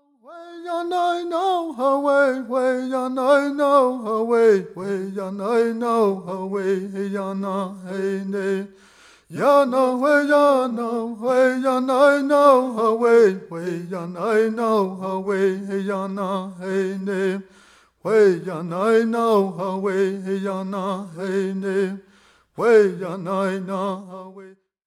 Dineh Prayer Song

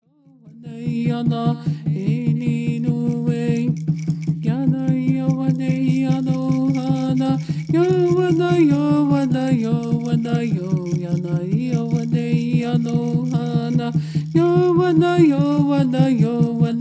Peyote Song

